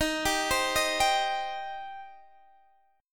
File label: Eb 6th Eb down Guitar Standard